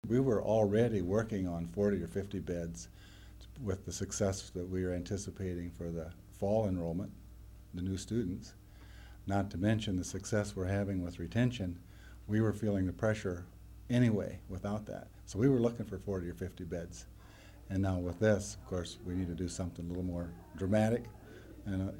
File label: dramatic